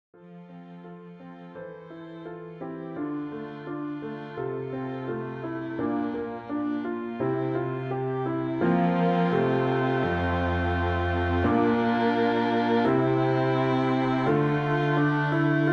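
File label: low accompaniment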